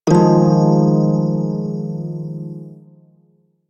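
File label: Prime Shawzin Minor Chord F; Music; Warframe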